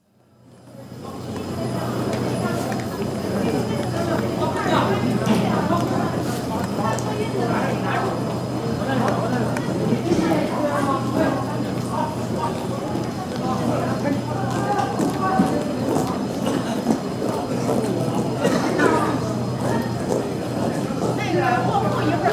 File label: Beijing: Platform of main railway station; China; Train Station Sound Effects; BBC